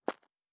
Dirt Run Surface, Footsteps; Survival Game Sounds - Lite Edition

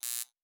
Electric Spark; Survival Game Sounds - Lite Edition

